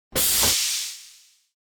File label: City bus air hydraulic suspension air release